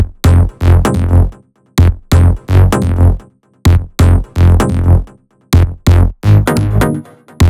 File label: 128BPM Melody; Vengeance Samples; VFH1 128BPM Pills Kit